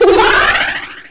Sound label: MONSTER; Heroine Iysayana Trilogy